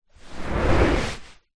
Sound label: household beanbag small slide on carpet; zapsplat